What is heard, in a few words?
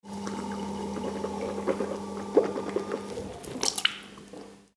rinsing mouth with water